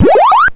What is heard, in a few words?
pacman ghost eat